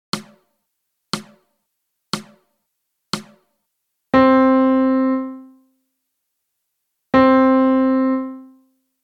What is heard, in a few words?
Melody; Lesson 1 Easy; Read Rhythms